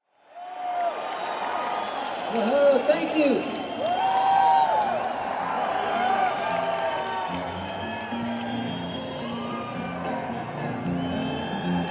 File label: Country Ditty live